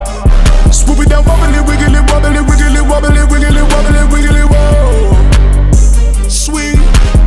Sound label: adlib